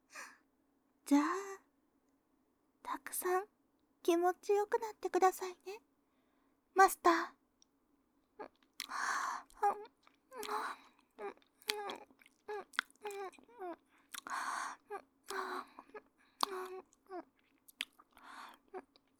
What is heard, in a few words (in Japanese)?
耳舐め系; リリスチャン